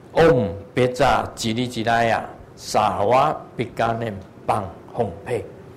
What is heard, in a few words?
Dorje Phurba Long Mantra